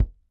Kick, EMU PX-7 Drum Machines

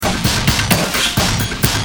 Sick kit, Drums Perc; Industrial

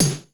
DEEP TAM SNR; 90 sSampleCDs; Crypton - DrumBase5000; GARAGE STACK

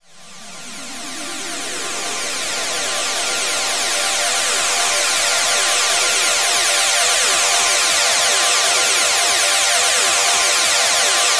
ATM SYNTH; Best Service - XXL Production Effects

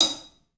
club hat; 3D Sound; 3D Drums

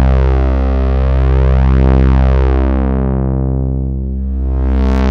SYN, Synthesizers; Soli-Music; World of Synthesizers VOL-1

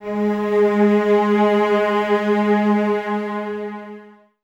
CES G#3, Cellos, String Ensembles; Miroslav Vitous; 90 s Sample CDs

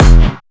Distortion Kicks, Bassdrums

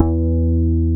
BAS MKS-50; Best Service Gigapack 2

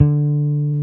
Basses, Roland

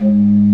G#2, BOURDON PED, Organ

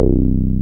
303, TB-303 BASS

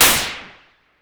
Cwejman S1 Snip Shatter

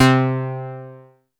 Prophet short metal bass D#3; Oldies

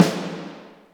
Concert SNR; Roland M-OC1

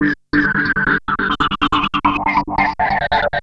Treat Guit, Industrial Nasty

